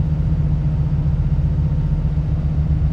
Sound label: Sonic Ferry Engine Loop